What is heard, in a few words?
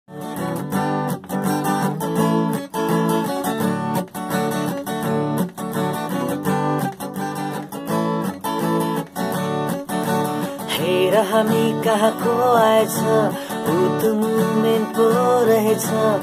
Opening Intro Song in Nepali Cover; Moomin